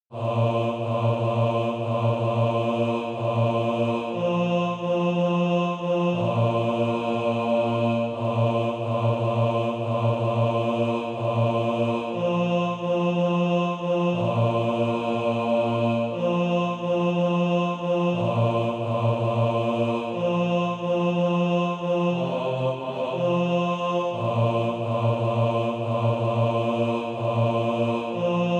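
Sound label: bass, Practise Music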